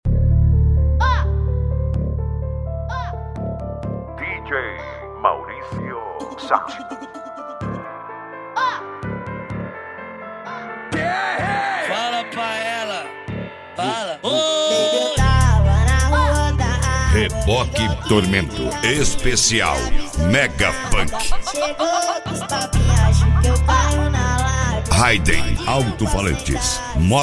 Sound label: mega funk; cd reboque tormento